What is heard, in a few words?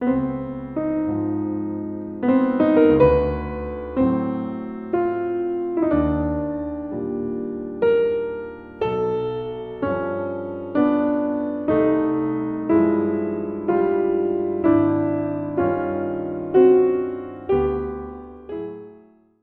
steinway theme